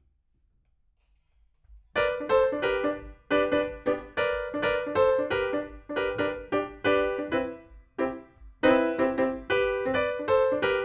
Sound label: PIANO, RNR for piano